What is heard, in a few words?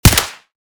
vss fire; STALZONE